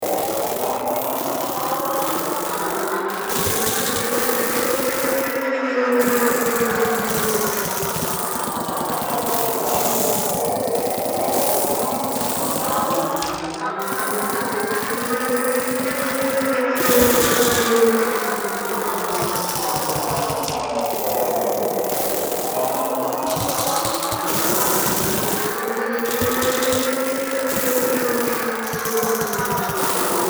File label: crunchy